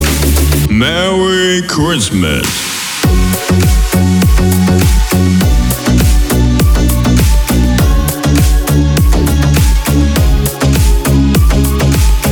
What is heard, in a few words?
speed up